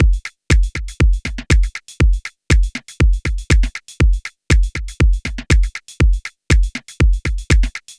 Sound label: 120 Drum Loop Kock Full